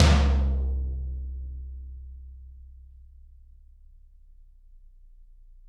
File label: Toms, Drums; Bob Clearmountain Drums I